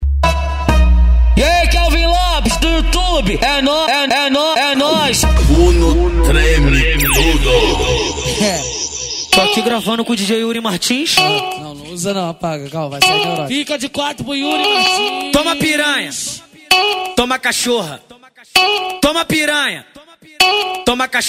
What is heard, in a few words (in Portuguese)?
especial funk